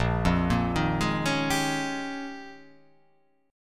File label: Bb Minor 13th, Bbm13 chord; arpeggiated; JGuitar